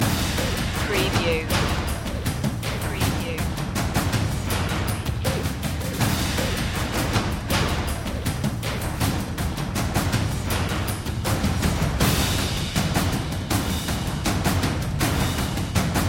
percussion only

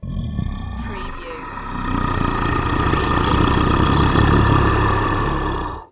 Monster Animal Sneer

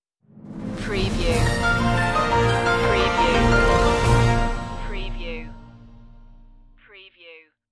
news stinger

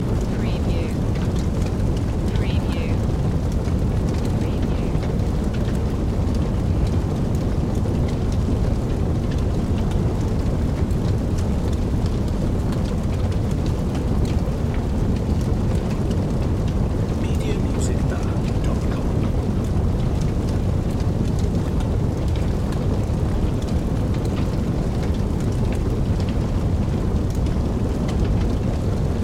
Fire Flames Crackle

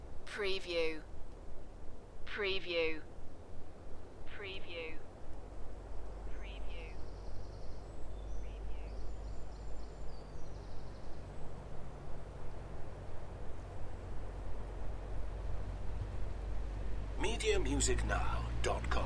Welsh Forest ambiance distant cars and drive by; beanstalk audio

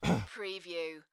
Male throat clear; beanstalk audio

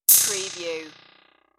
Clickie

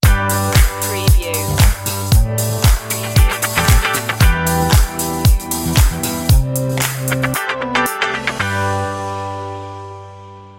mercury stinger

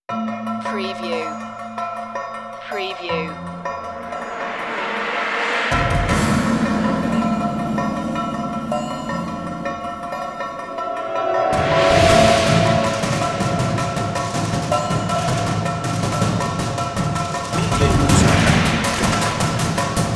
martial strike 160bpm

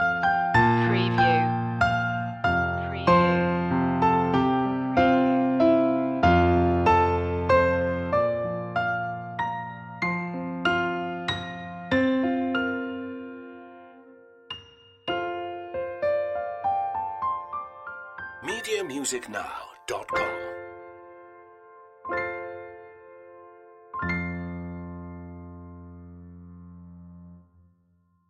the first noel piano loop end; media music now

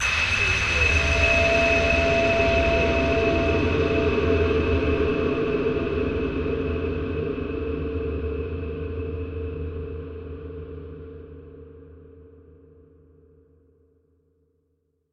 Hit Metal

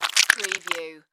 Squish Suction Blood

